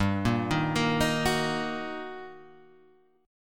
G Minor Major 7th Sharp 5th, G, down, Guitar, Half Step Down